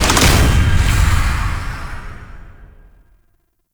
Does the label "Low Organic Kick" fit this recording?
No